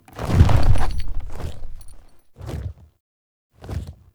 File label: Horse Glide Wing Flap Ambient; Warframe; Duviri Horse; Vehicles